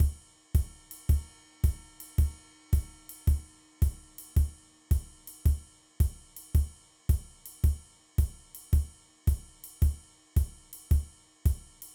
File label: Four on the Floor Swing, drum